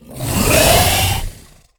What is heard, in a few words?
Dragonkin Attack